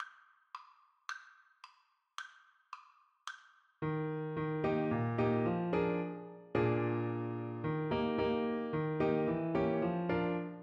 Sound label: rosita, Trumpet; tarrega